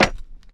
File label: metal solid, footsteps; player